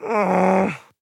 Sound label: Groan; EDDY; Ed Edd n Eddy - Adromulus Pack; EEnE